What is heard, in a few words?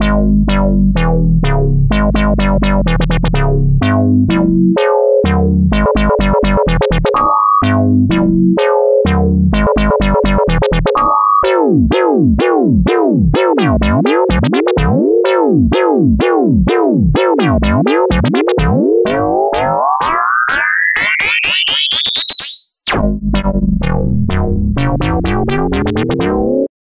Shift on Synth Bass